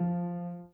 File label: Piano Chord